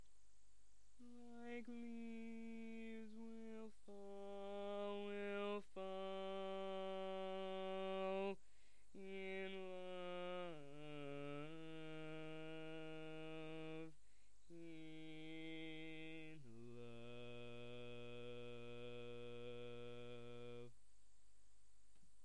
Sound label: bass